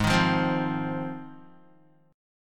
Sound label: Ab 6th, Guitar; strummed; 3,6,5,5,5,x; JGuitar